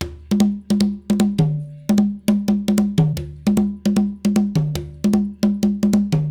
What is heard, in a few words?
CONGAS, RITUAL 152; ILIO - Ethno Techno